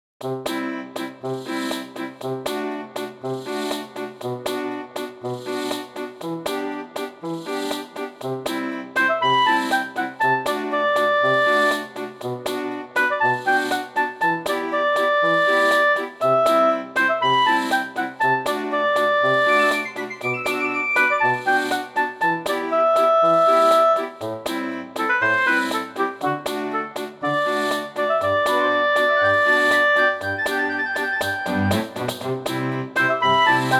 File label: Fun village